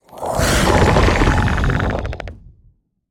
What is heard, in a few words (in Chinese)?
咆哮声; 监守者